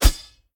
swish; smite; gilgamesh

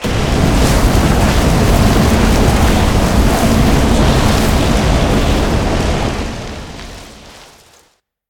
tornado; Smite; god Kukulkan v5

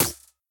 Stick Click; smite; raijin

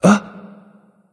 surprise; smite; hou yi

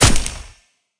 swing hit; smite; tiamat

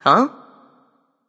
confused; smite; pele

